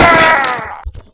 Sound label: death